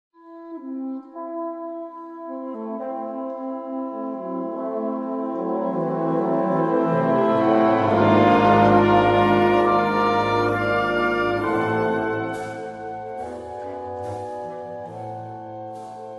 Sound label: clarinet